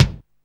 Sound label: Kick, Alesis Hr16, Drums